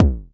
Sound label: Noisy bd, Jomox Airbase99